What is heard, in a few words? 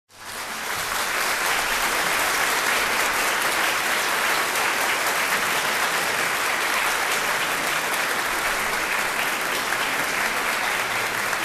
Award Ceremony Applause